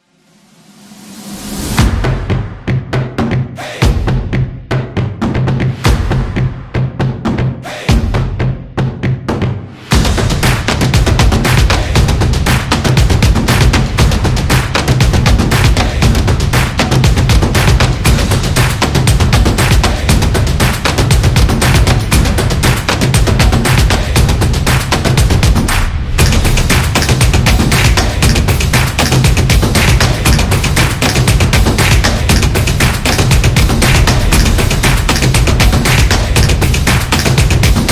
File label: Promo Beat, stomps and claps